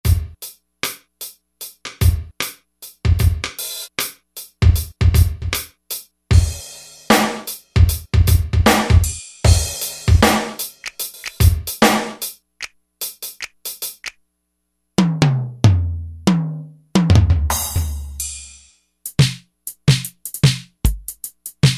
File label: Rock Drums; E-mu Proteus-1; Presets